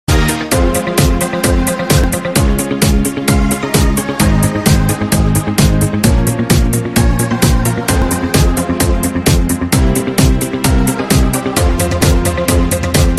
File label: instrumental ringtone